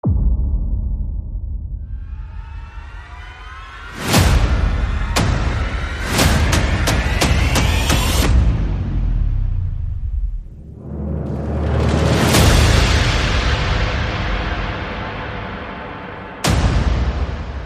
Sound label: Percussion and Effects